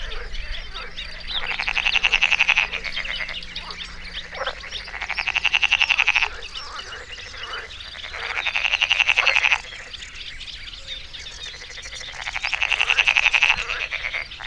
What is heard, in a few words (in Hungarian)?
természet, békák, madarak; belso vegallomas; hortobagyi halastavak